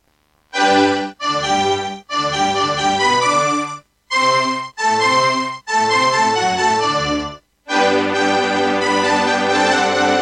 Serdaco Qwave; Mozart - Eine Kleine Nachtmusik partial; classical

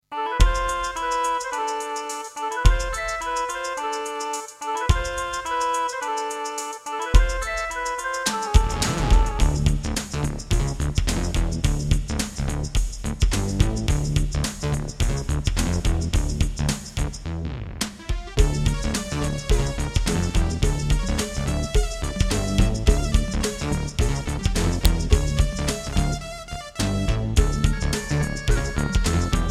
Creative Wave Blaster II; Lost Vikings

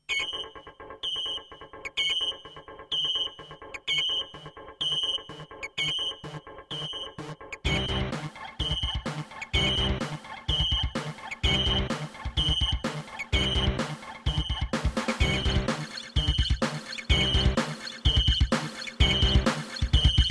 Roland SC-8820; System Shock - Intro

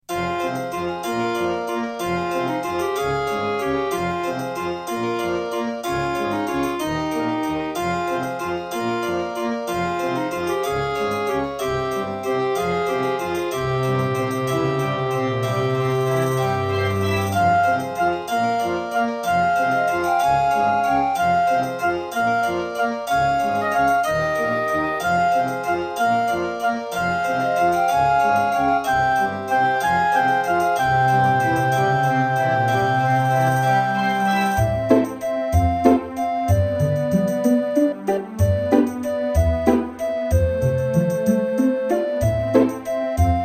Roland SC-8820; Death Gate

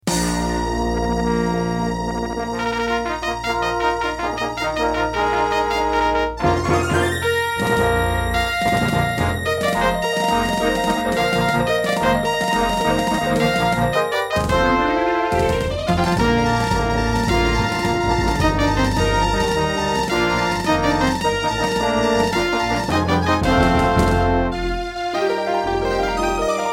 Intro, Creative Midi Blaster MB10; Dark Forces

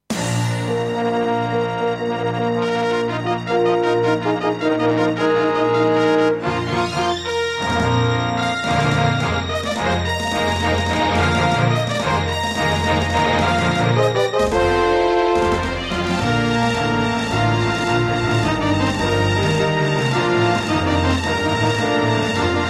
Intro, Trust Korg Super Sound Module; Dark Forces

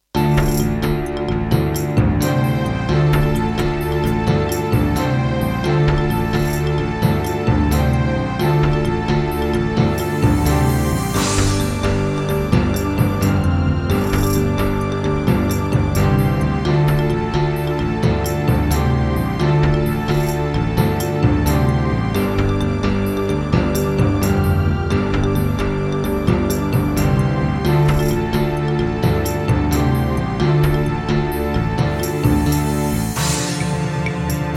Korg Audio Gallery AG-10; Heretic

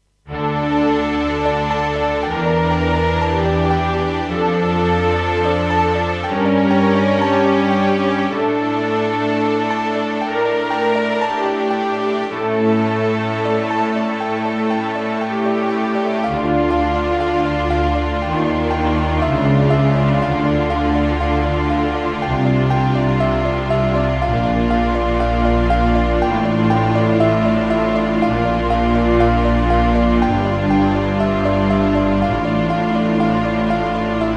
EMU Sound Engine; Blood

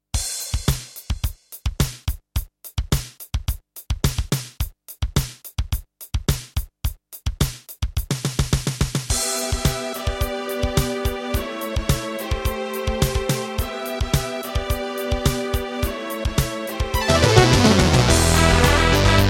Terratec WaveSystem SWC001-2

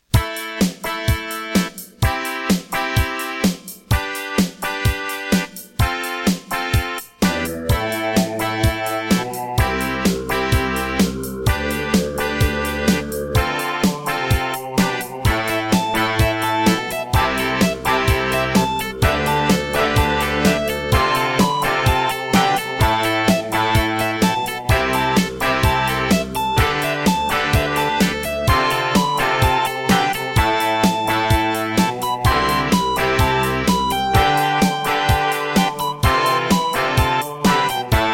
Miditemp DS-48 CleanWave32; raptor call of the shadows

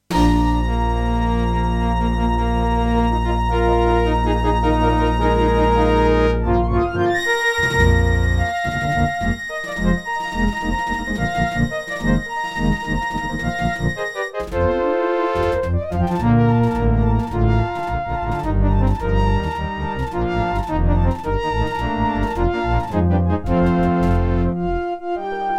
Intro, Serdaco McFly; Dark Forces

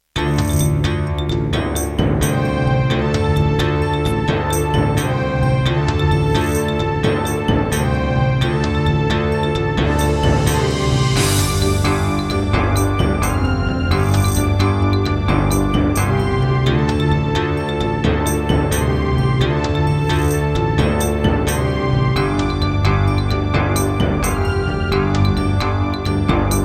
Roland SC-88 Pro, wavetable; Heretic

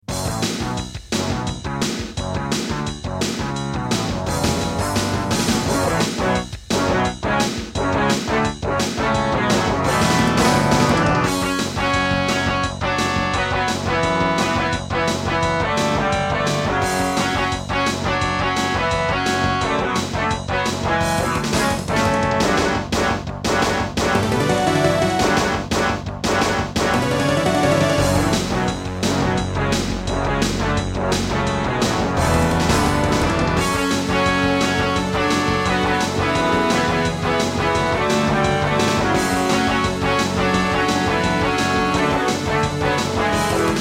Intro, Ast Advantage DBII A; Duke Nukem 3D

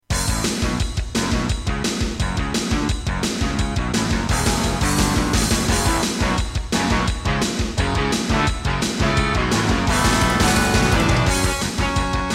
Roland SCB-55; Duke Nukem 3D

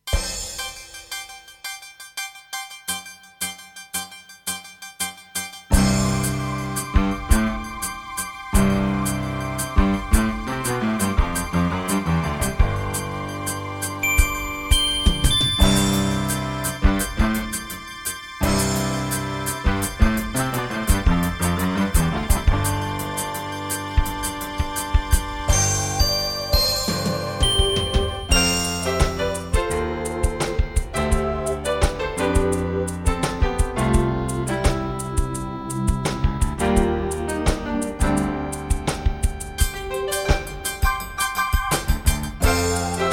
EMU Sound Engine

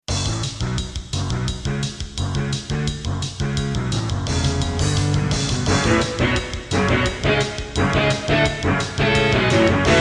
Intro, Creative SB AWE32; Duke Nukem 3D

